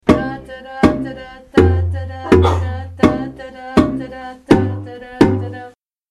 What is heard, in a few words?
bass low, marimba